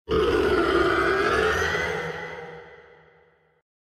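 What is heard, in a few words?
dimorphodon; letayuschih dinozavrov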